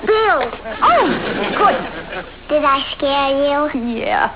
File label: scare; halloween